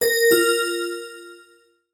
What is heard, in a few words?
alert